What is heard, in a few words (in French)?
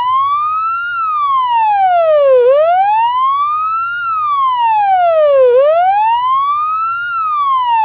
alerte wail